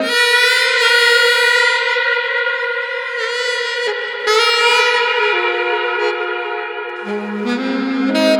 Sax Effect; Sonic Foundry (Sony Creative Software); Jazz Solos and Sections; Effected Sax

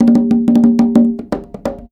Percussion Loops, Bongo & Conga; Houseworx

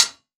snarelike

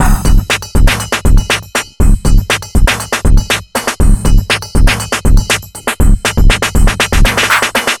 funky hip 120bpm, Downbeat Dance Grooves

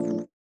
VIBRA; Spectrasonics; Metamorphosis

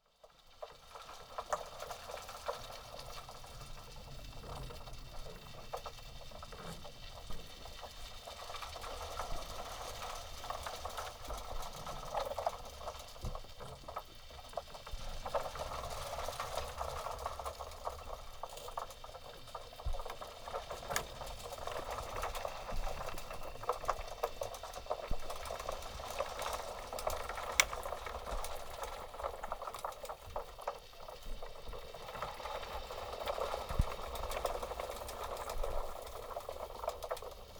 Hydrophone, Underwater, Harbor; underground sounds

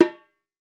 REPIQUE; Latin Drums & Rain

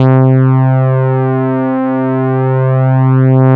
LEAD, Nord; Wizoo - Powered Nord; LEAD PAD HRN

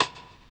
RAGGA; Spectrasonics; Metamorphosis; RAGGAVYNL

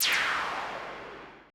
AMBI FX

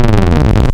Flange Saw, wavetables; Animoog

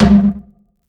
RACK TOM UNDERWATER

